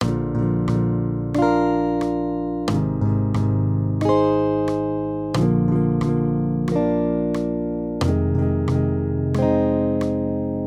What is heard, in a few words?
maj triads